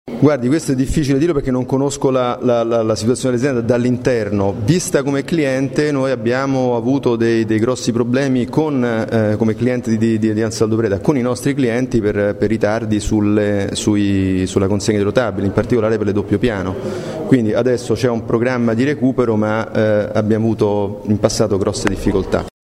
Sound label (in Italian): Soprano